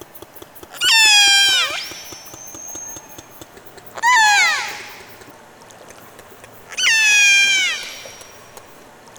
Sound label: Orca Resident Call; Jim Nahmens